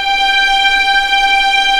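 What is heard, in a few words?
G5A legato piano, violins; East West; The Ultimate String Collection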